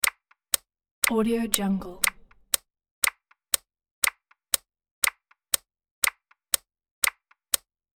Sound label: mechanical clock working; industrial sounds; تیک تاک کار کردن ساعت